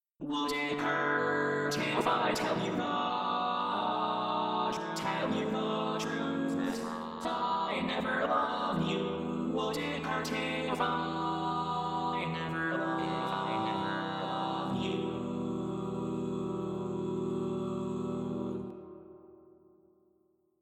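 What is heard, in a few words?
baritone, barbershop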